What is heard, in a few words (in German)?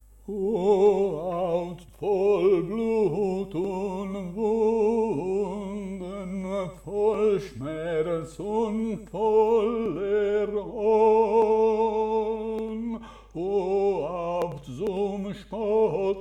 O Haupt voll Blut und Wunden - tenori; Passio